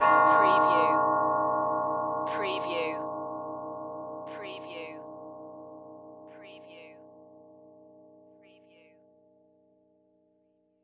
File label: Grandfather Clock, Bell, Single Stroke